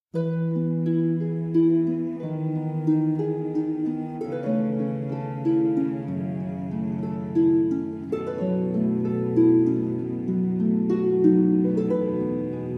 Harp

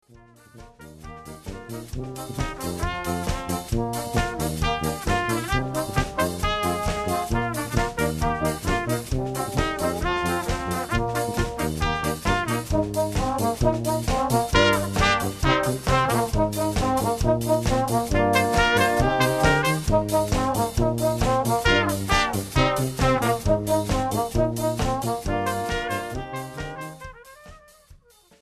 Brass

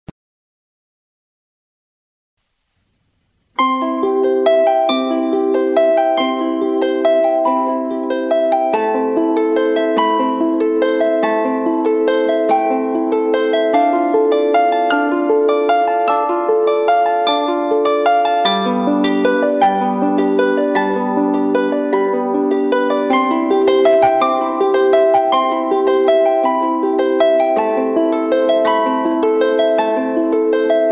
harp

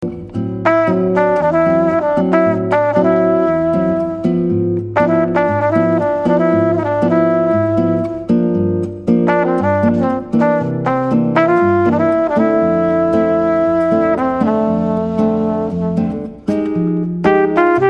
Brasilian duet

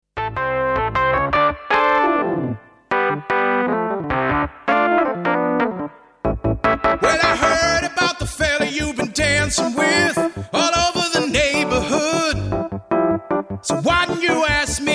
Bassline

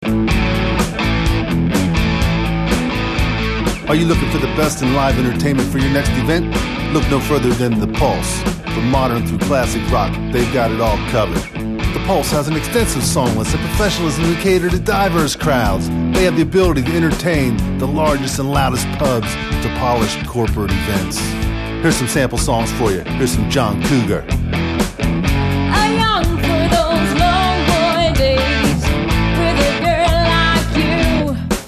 pulse

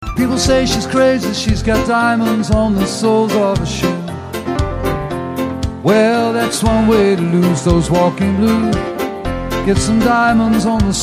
Vocal

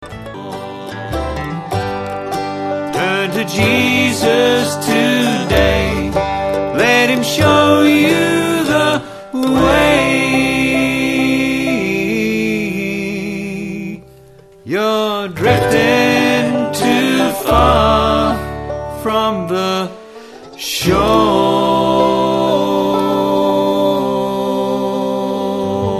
bluegrass

